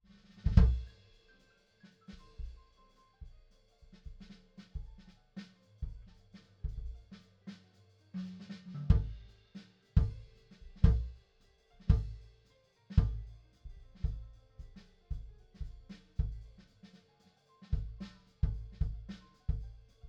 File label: kick inside